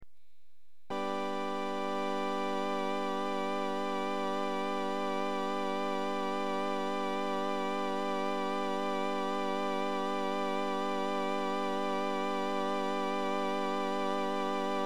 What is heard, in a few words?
Vibrato, Rheem combo organ